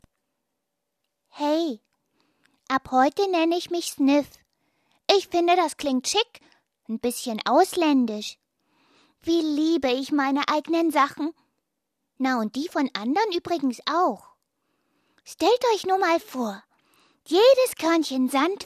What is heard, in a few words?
Sniff; Die Mumins